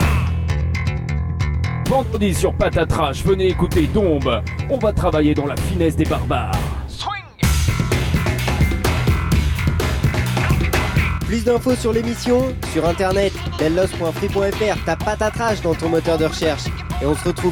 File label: jingle